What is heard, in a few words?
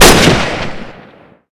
k43 shoot, weapons; svencoop; ww2projekt